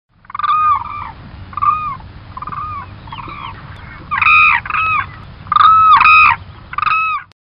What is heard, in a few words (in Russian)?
kriki zhuravlya; shum prirody